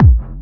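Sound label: hard bass drums; Bazzdrumz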